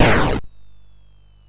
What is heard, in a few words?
Frantic, MOS6581R4